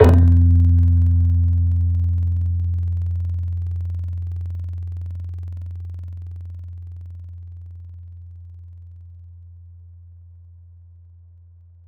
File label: Music Box; Instruments; Synthetic's Serum Collection; Echo Sound Works Core Tables